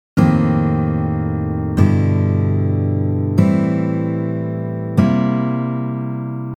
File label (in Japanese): スピード エモい; emo code 4types 3steps